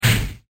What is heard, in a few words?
Kamikaze Release